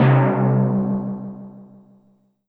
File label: High Timpani